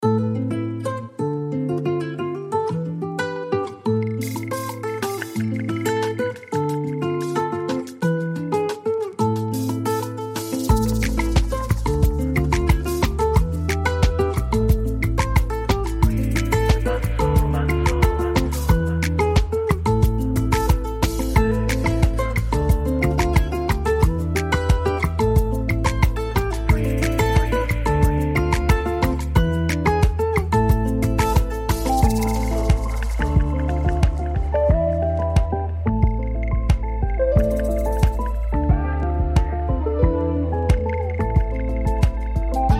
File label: African Lofi, Afro Lofi, Afrohouse; Afro Soul